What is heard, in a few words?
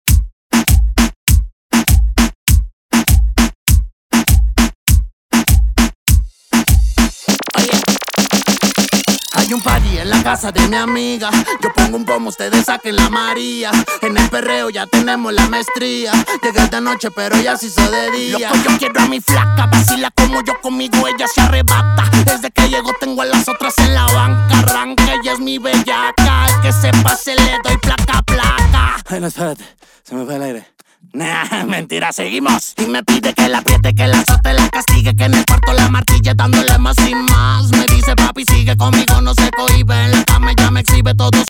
remix bpm 100; Prodeejay Remix